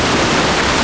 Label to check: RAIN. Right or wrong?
Right